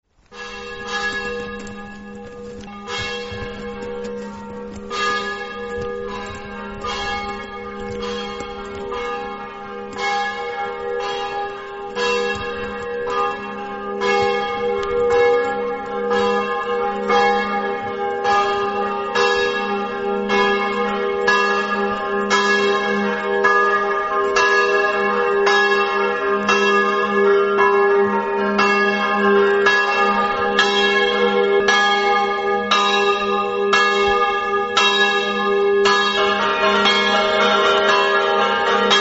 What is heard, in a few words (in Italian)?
campane, Pioverno